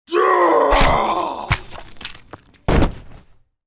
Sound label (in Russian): krik udar krov padenie